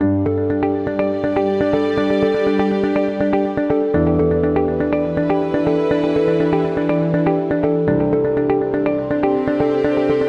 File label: Ambient Inspiration; medical background